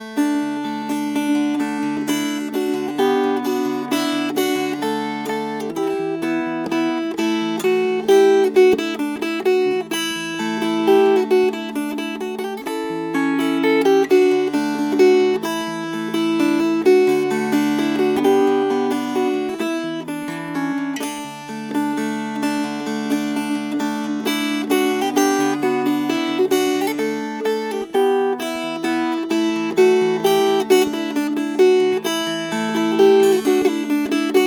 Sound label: Fingerpicked